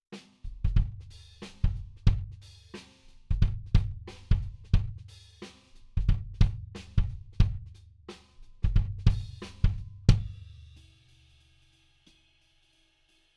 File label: Kick